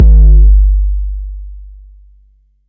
808's

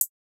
HH, Closed Hats

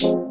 synth

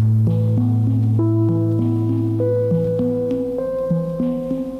deer forest

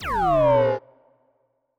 lzr

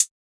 hihat flute song; Closed Hats

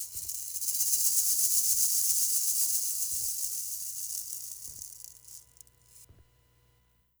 SHAKER

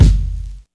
Kick

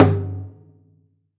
Percussion

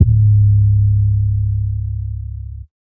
Not Nice 808's

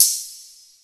Open Hat; [AP]

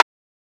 Rim

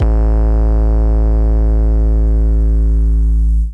Harm Dist 808; 808s

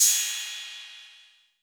Hats, Closed Hats

